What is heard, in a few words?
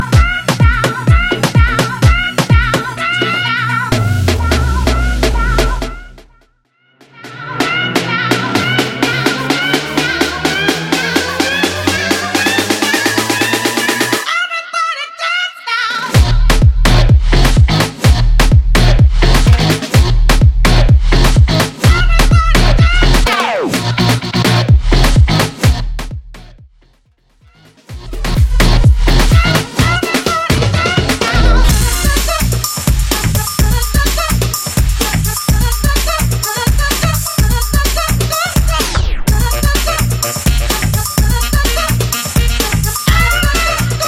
90s Bootleg Festival Mix 126 Bpm